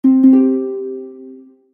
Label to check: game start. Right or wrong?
Right